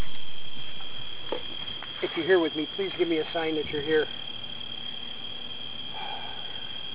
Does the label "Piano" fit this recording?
No